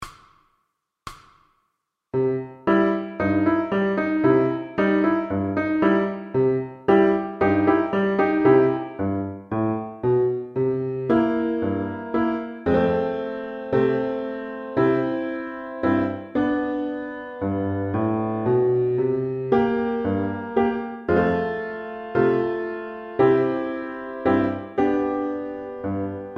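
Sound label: VLN Summer Dance, piano accompaniments for violin; MTB Grade 1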